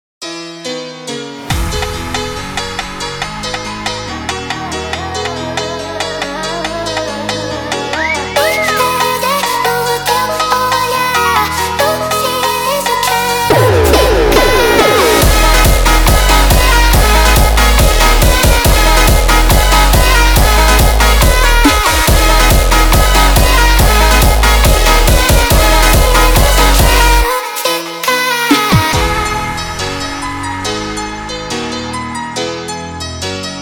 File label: Hardstyle sped Up